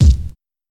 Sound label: Bumpin' Bass Drum C# Key, kick tuned to the C# note; kick drum samples